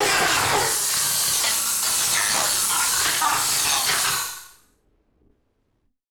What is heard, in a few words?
Malfunction Synth, malfunctioning synth samples